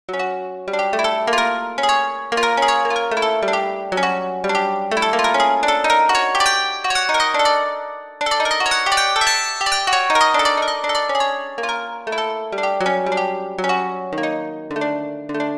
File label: key step strum